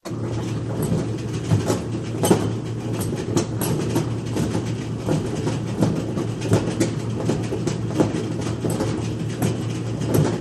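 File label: Hay Conveyor Start Run, factory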